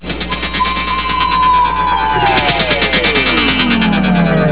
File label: bionjump